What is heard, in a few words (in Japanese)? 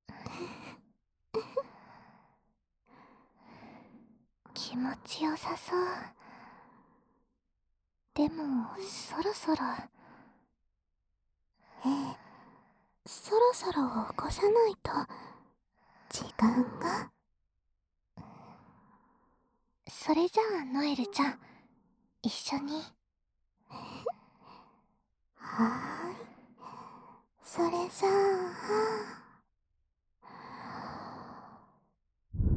反転 両耳舐め攻め; みみなめぇ～る